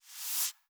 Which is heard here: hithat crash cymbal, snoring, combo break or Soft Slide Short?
Soft Slide Short